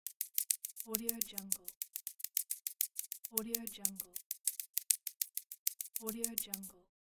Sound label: small animal character walking footsteps